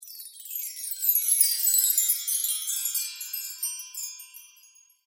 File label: chimes